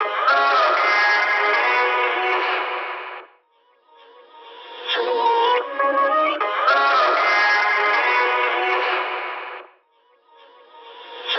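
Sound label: vocal chop, 75 bpm, G#m; MyMind; Slate Digital Omnivox Vocal Sample Pack; Chops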